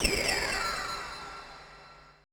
AMBI FX

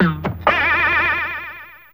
Arrow, Fairlight CMI IIe Effects

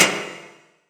snarelike

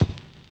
RAGGA; Spectrasonics; Metamorphosis; RAGGAVYNL

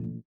VIBRA; Spectrasonics; Metamorphosis

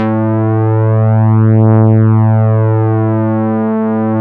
LEAD, Nord; Wizoo - Powered Nord; LEAD PAD HRN

